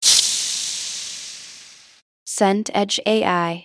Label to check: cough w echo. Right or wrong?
Wrong